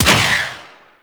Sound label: Space Turret Shot B; Warframe; Ambience; Space Battles